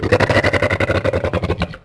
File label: Cerato Growl, Dino